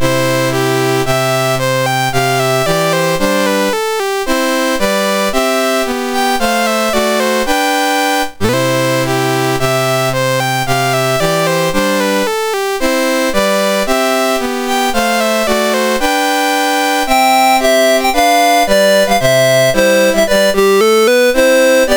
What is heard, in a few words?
Spain, National Anthems, MOS 6581; MOS6581R2